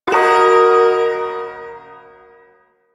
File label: Ersatz Shawzin Yo Chord; Warframe; Duviri Ersatz Shawzin